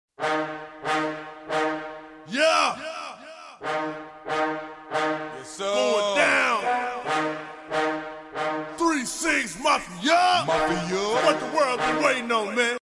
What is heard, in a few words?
hip hop ringtone